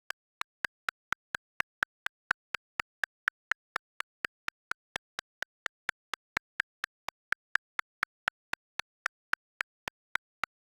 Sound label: 1 clapper enthusiastic